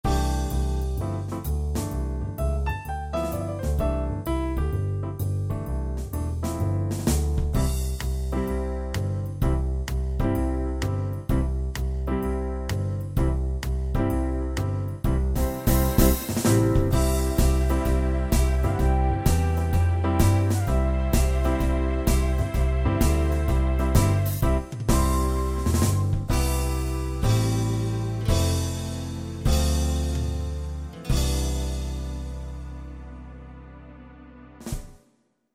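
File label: SWING POP C major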